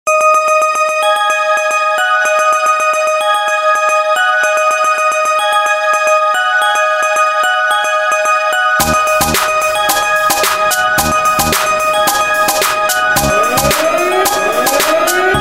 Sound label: Xylophone Instrumental Ringtone